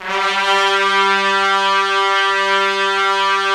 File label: Trumpets; Roland - LA Composer vol. 4